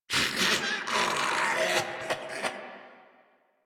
Laugh Ghoul Sarge, Barks Battle; Grineer Ghoul Drill Sargeant

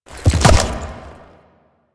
Enemy Horse Stomp; Warframe; Duviri

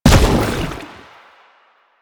Step Default, Footsteps; Warframe; Lasrian; Lasrian Soldiers; Foley; Lasrian Chem Strike Nox

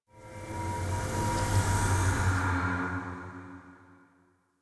Swarm Screen Scan Start; Warframe; Entrati

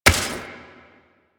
Step Dirt, Footsteps

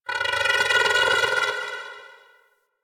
Sculpture Movement; Mountain Pass; Warframe; Ambience; The War Within

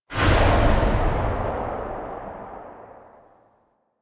Shotgun Tail; Weapons; DE; Warframe; Tenno; Pod Shotgun

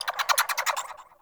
Bird Clicky; Ambience; One Offs; Warframe; Eidolon Plains

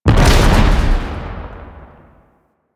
Street Barrel Explosion; Warframe; Nineteen Ninety Nine

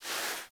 Kubrow Foley Idle Paw Swipe; Enemies; Warframe

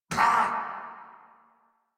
Pain Grineer; Warframe; Grineer Chem Nox

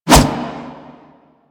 Melee Kick Whoosh; Warframe

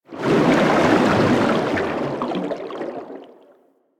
water movement by; Warframe; Vehicles; Sharkwing

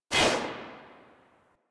Rifle Shot B; Weapons; Warframe; Tenno; Bard Rifle

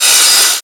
Metal Impact, Weapons; Warframe; Tenno; Tenno Miter; Hit Effects

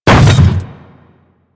Hammer Boss Step Default, Footsteps; Warframe; Grineer

